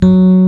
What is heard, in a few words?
RHODES F4; E-MU Formula 4000 Series Vol. 5; Protozoa